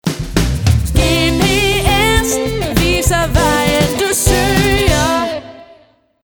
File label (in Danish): Jingel Mellem Sang; GPS